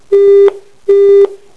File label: rejected; jitsi